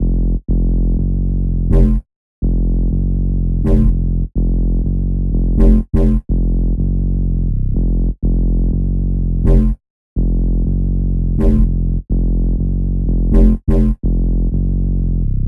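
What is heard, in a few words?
bass F minor 124; malaz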